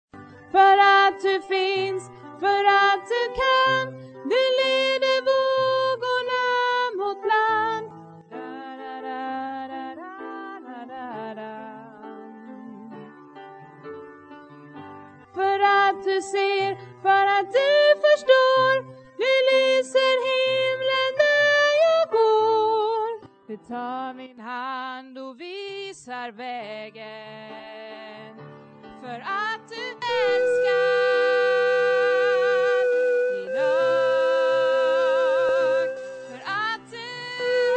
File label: tenor